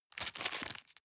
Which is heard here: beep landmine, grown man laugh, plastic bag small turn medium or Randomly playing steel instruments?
plastic bag small turn medium